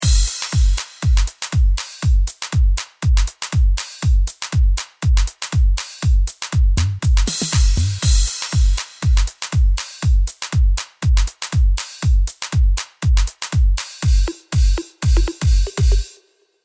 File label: Beat EQP 60Hz 16kHz; 5db